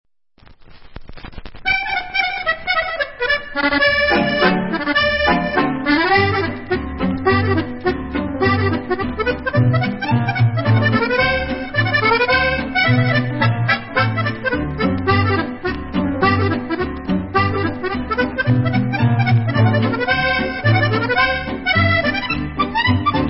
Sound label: Ke'achim Chamim Hot perches American Instrumental